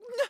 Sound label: Jump